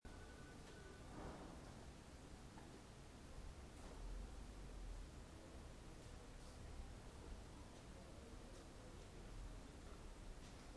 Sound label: street sounds during siesta